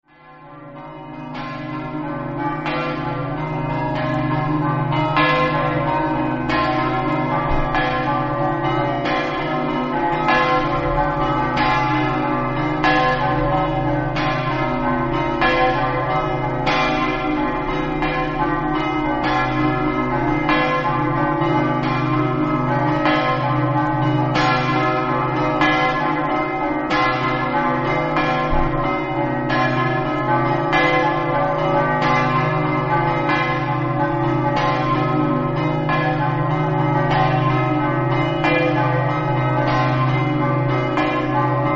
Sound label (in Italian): basiliano, campane